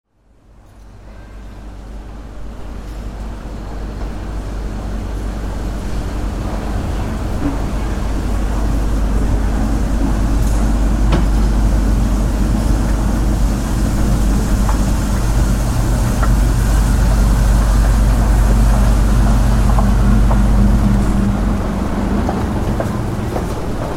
Store Escalator, Recording of riding an in store escalator; Escalators